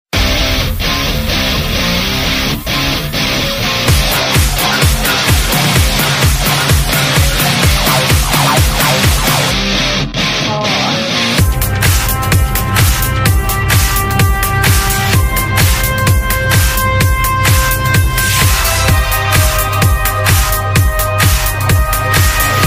theme; wwe charlotte flair

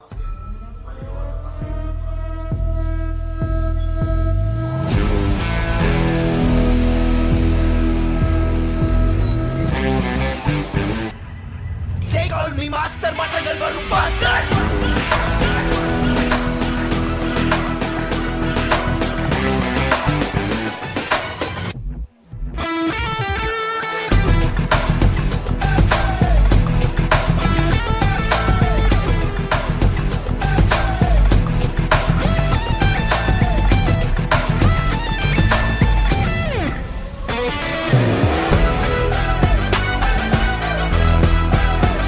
BGM; Master JD Raids

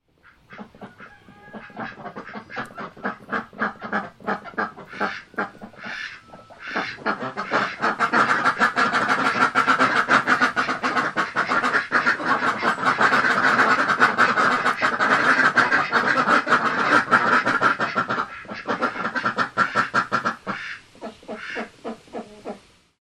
poultry domestic geese and chickens

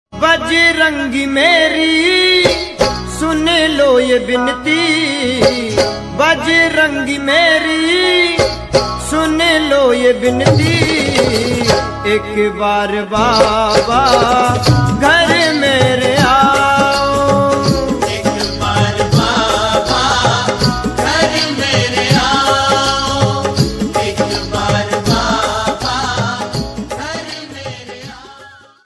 Hanuman Chalisa Ringtone; Bajrangbali Bhakti

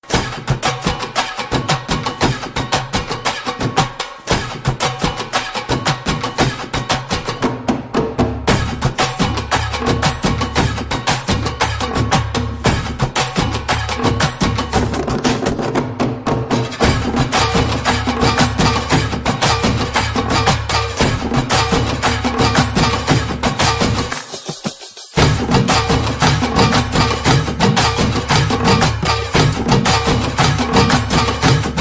Instrumental Ringtone

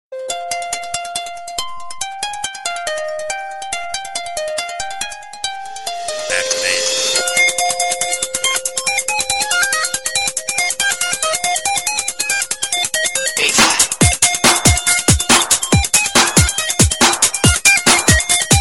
airtel old ringtone

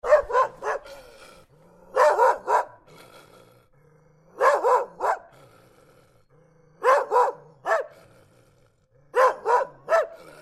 angry dog bark and growl

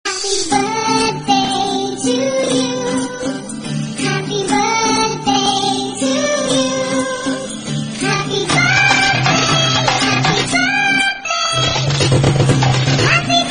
happy birthday

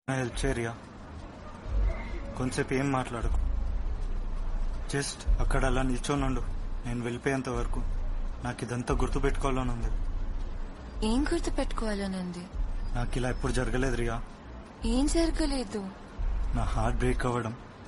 Telugu love sad

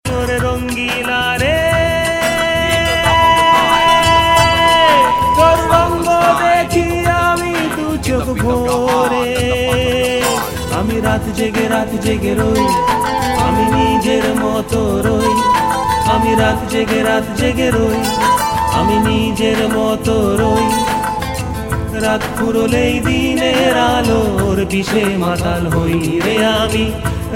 Bangali Song Ringtone

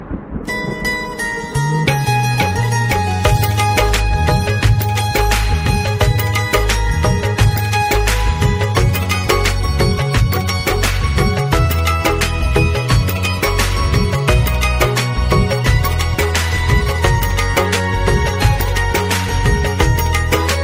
Song Instrumental Tone

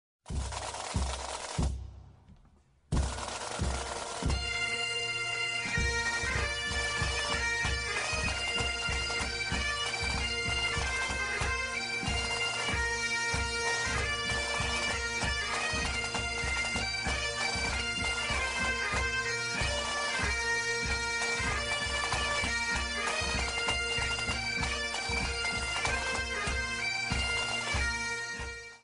theme song; Rowdy Roddy Piper Hot Rod WWE

